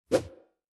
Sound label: Swosh